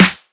short steel snare drum F sharp key, Royality free snare single hit tuned to the F# note